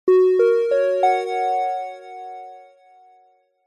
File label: public places pa system tannoy bell electronic chime dry